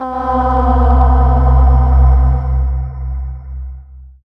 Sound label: Ahh Pad; Zero-G Jungle Warfare 1, 2 & 3; 90 s Sample CDs